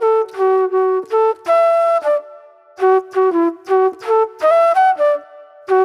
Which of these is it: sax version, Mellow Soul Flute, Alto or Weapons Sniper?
Mellow Soul Flute